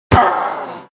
Witch dies

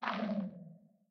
Sculk Sensor sculk clicking stop

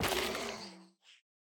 Sculk Sensor break

